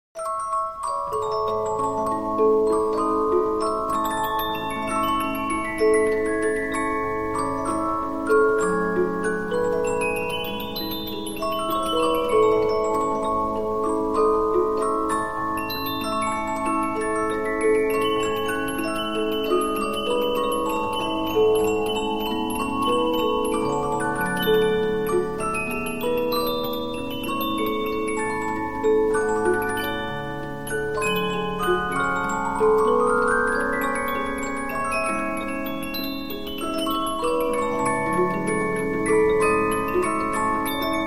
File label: Polyphon, 15inch disc musicbox